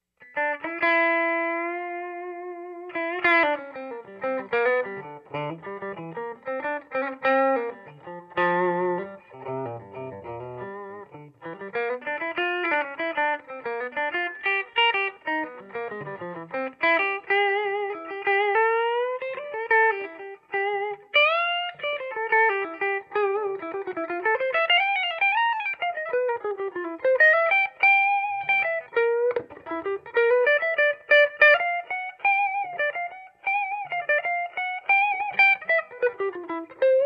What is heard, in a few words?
Ragin Cajun solo 335